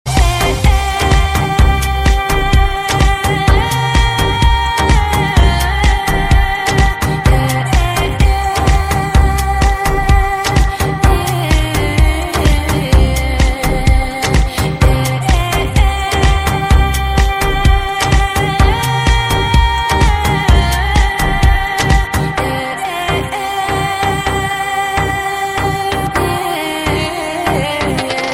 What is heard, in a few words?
Egyptian Beats